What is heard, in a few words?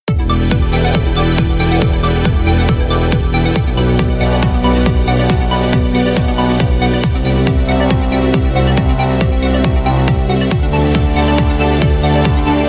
kick duck